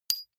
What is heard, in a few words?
medium metal impact medium high